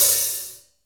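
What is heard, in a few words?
HH, hi-hat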